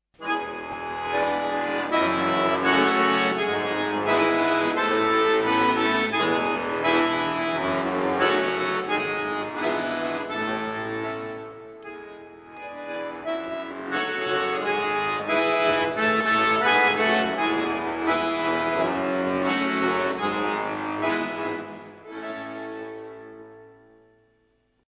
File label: Russian folklorist song; Barynya